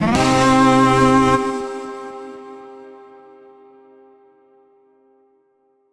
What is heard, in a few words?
Retry Jingle; Unbeatable; Friday Night Funkin' Mario's Madness; PC mod gamerip